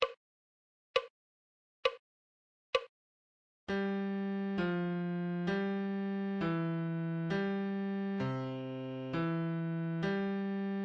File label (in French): Clé de Fa Adagio